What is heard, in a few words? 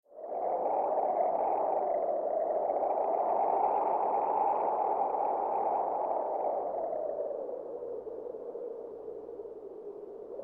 wind stereo sample